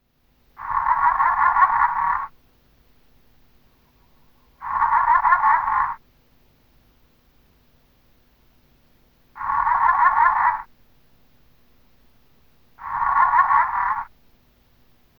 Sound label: Discoglossus Pictus Auritus, amphibia